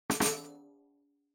Tambourine Dry

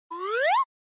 Slide Whistle Dry

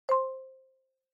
Xylophone Multi

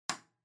Light Switch Box Rocker Wall Mid

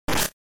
General Noise

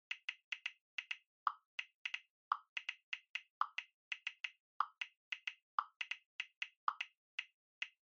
Smartphone Typing Speaker Fast

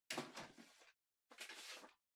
Printer Far Cassette Tray Open

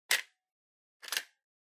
Portable MD Open Eject

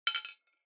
Drawing Machine Garapon, Drop

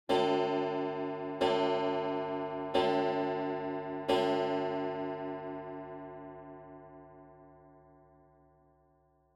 Pendulum Clock Chime Long; Denoise

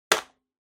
Tabletop Timer Digital Button; 卓上タイマー デジタル01-04(ボタン); タイマー機器

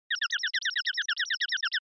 Onoma Panic Low Short

